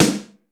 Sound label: DRY S, drum; Crypton; DrumBase5000